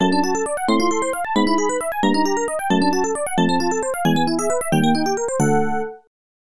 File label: Unused BGM